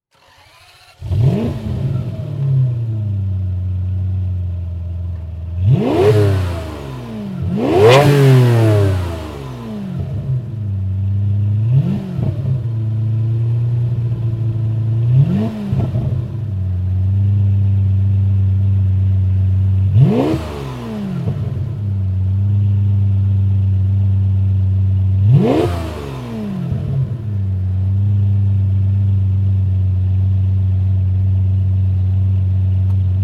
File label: Ferrari 512 M